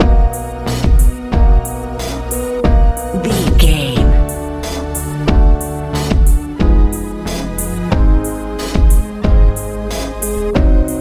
good hopeful techno

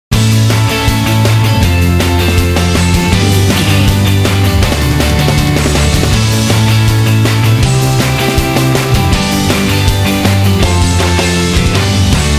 rock indie rock sting

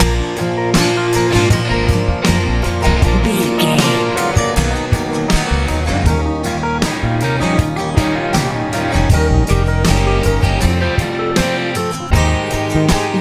country pop

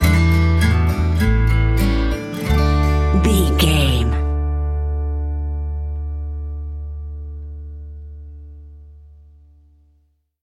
sweet times you sting

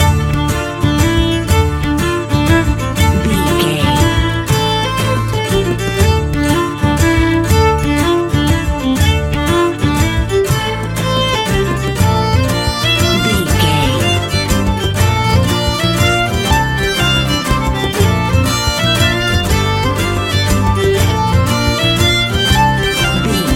irish folklore 120 bpm loop

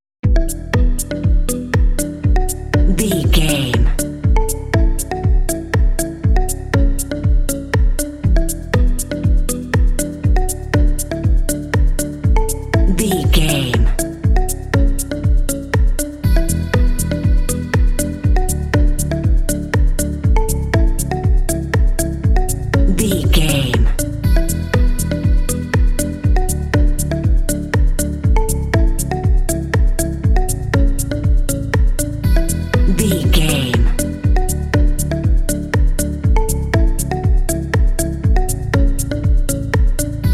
cool confident deep house

